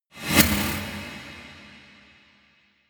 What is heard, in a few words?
hit hiss short